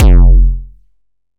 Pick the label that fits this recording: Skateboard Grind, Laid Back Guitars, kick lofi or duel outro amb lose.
kick lofi